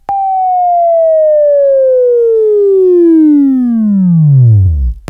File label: WAV Left, Sweeping; Labview-Program; Sweeping Training program